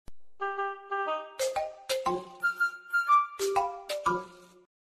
funny korean effect

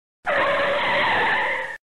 braking car sound effect drift brake

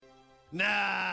nahahahah

